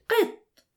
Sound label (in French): chat